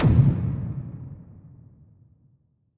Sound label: Frequency Impact